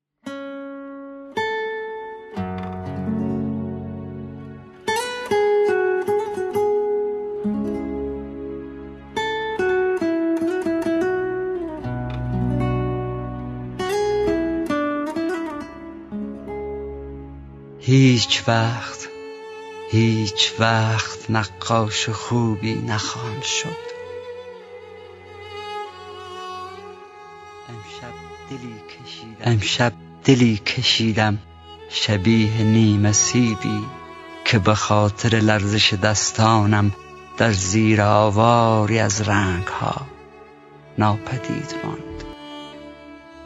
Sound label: Vocal